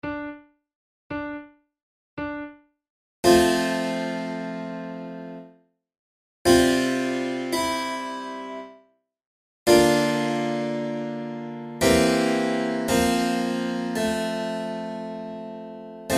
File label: recorder sonata in d minor largo; marcello op2 no2